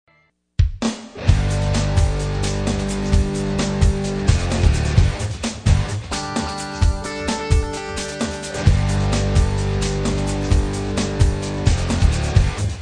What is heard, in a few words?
Jet Fighter